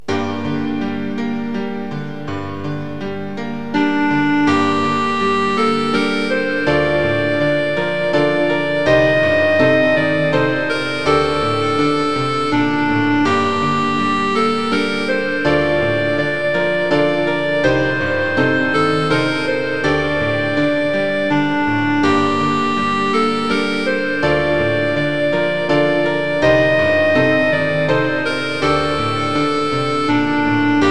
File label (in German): elegie klarinetteneinspielung